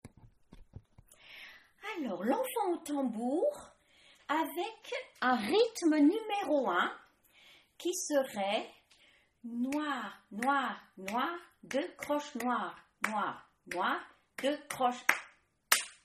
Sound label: Rythme